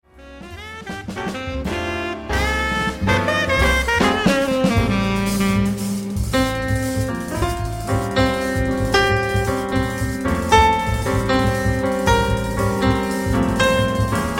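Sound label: pno solo, piano solo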